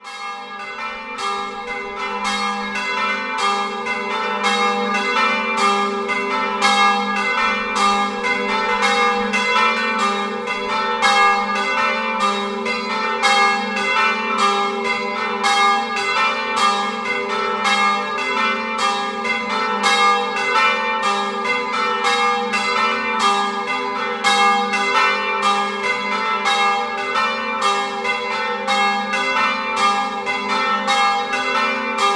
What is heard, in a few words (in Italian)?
Taiedo, campane